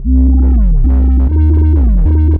Roland B Line, Roland S100; musicradar-synth-samples

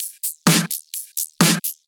Electro Loop 128 BPM; Vengeance Samples

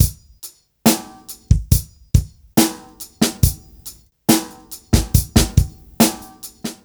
Slomo Beat 140 BPM, Loops, Slow Motion Beats

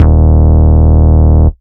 Root D#, Bass

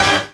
Synths G; vengeance essential club sounds-3

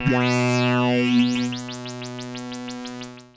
F B2, synth; Roland S100; musicradar-synth-samples; Roland F Multi

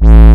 Offbeat Bass A; Vengeance Samples

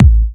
Hard Kicks; Vengeance Samples

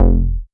Synth Bass; Vengeance Samples; VEE Synth Shots

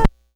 Needle Drag, Vinyl Record Samples

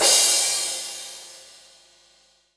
Crash, Cymbals; Vengeance Samples; VEH3 Cymbals

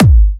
138 BPM Kick; Drums & Perc; Bminor

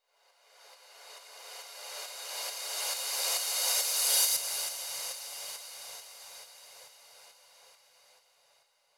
Universe Kit 137BPM Re Crash WET; Sound FX; D minor; Vengeance Samples

